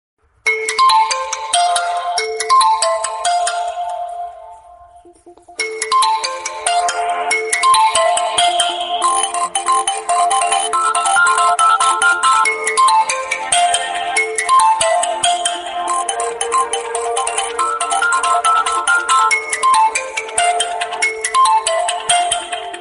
Oppo A54 Mobile Tone Ringtone

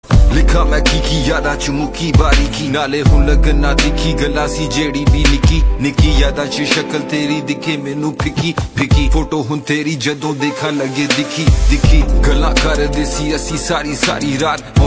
Punjabi

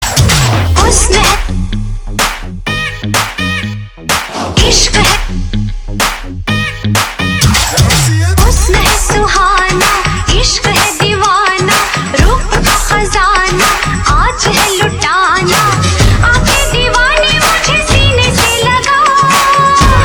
Hindi

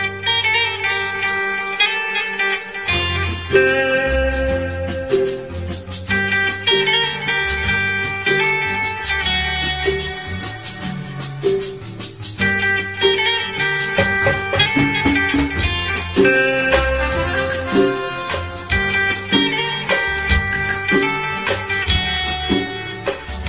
Instrumental Ringtone

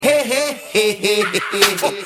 he he sms

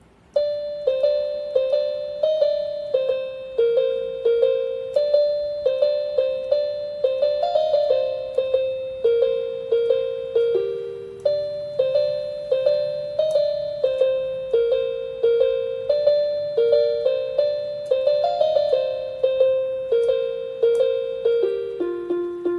Instrumental Ringtone

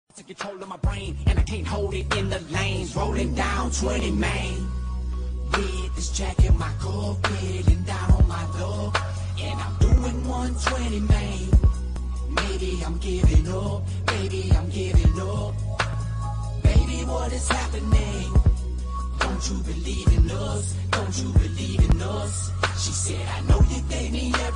ringtone

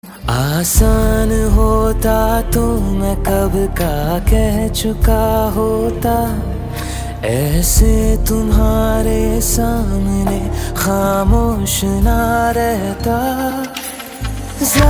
song ringtone

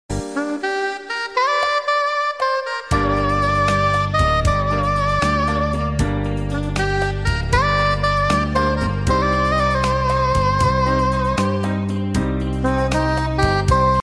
saxophone love ringtone

